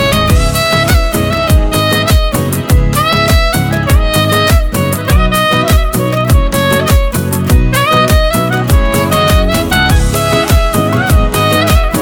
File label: Ringtone Mobile